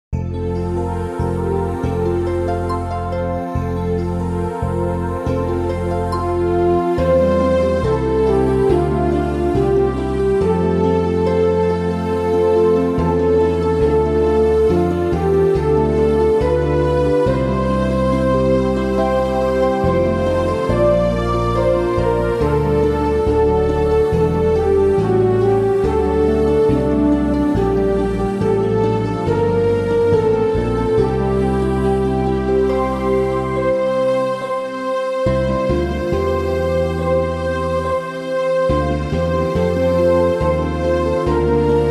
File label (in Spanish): Organos; Organos I; Rejoice, Ye Pure In Heart